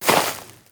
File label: Grass Turn